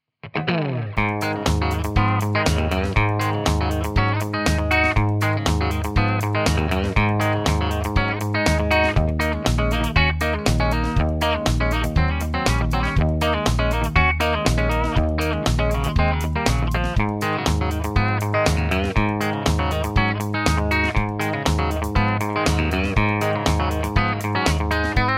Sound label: clean bridge, Tele; schwarzbach; Tele60s